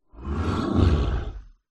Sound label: horror monster evil beast growl; zapsplat